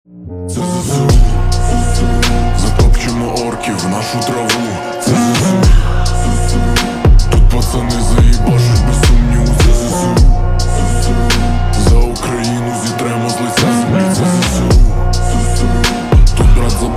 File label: slowed reverb